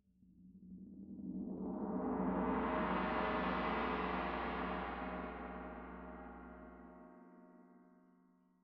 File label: Tam-Tam Close